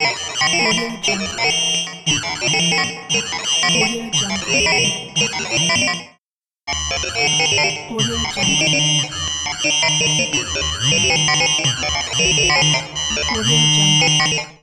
alien alarm

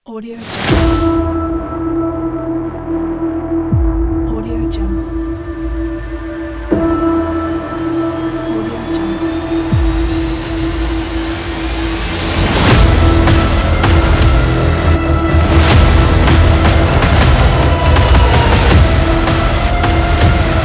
trailer logo

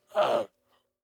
baby camel; prosoundfx

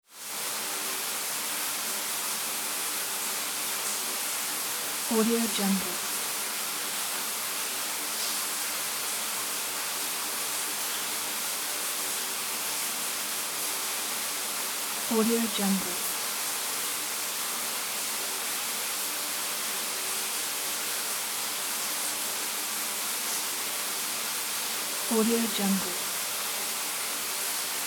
shower water running outside ambience

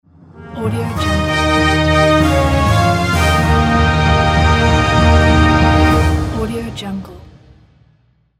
winner logo

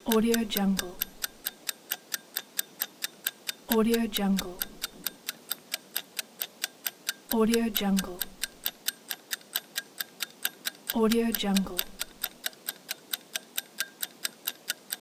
timer

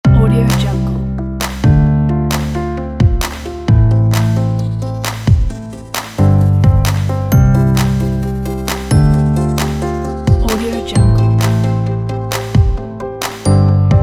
modern piano logo; audiohoney

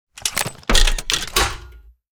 utilities rifle rack put down

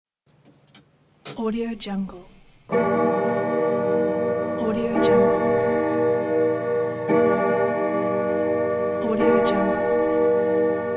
grandfather clock chimes

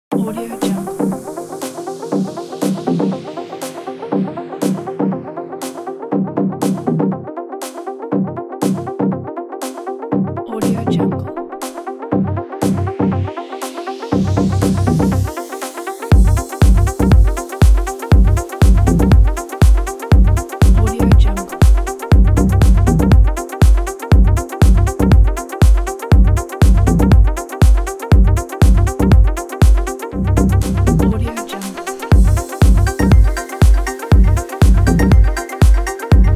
fashion deep; mehilovesound

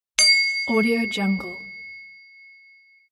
bell; mike-88

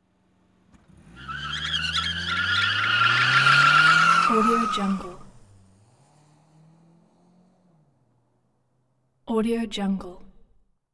automobile skid and squeal accent

